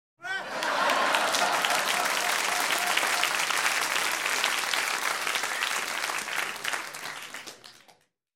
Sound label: Light Entertainment Audience - Laugh Into Applause; Rec. At Bbc Paris Theatre